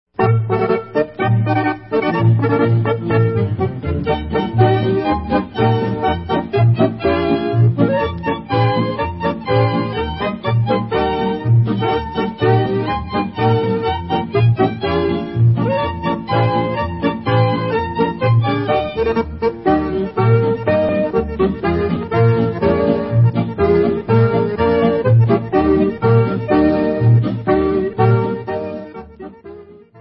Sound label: Polka, Folk; Polka Chechit Dudlebska Polka